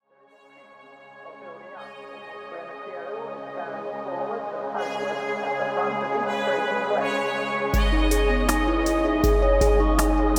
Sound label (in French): mix brut gainstaged; formation mastering